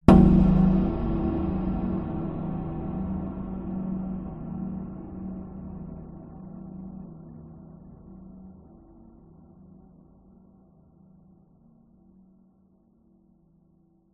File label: sound design cinematic hit dark reverberant shock terror suspense